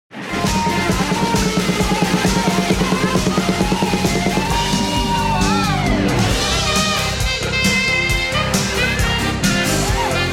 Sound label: sax in the club